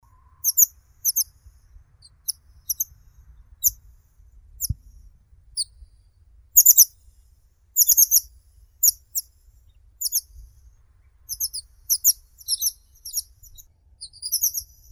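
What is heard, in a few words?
pipit, Anthus rubescens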